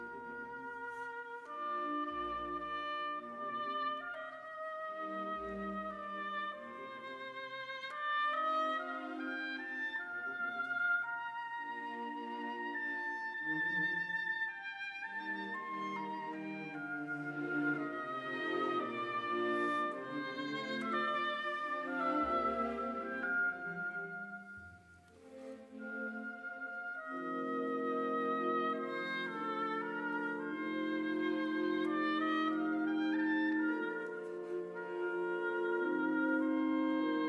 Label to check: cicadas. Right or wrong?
Wrong